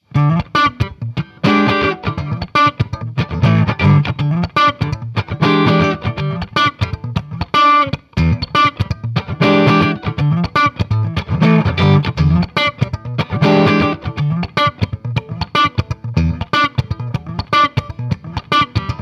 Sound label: slap